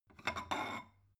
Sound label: stone bowl take soft